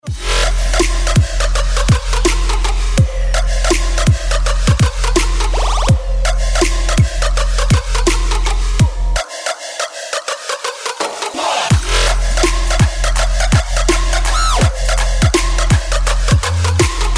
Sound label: Drop Beat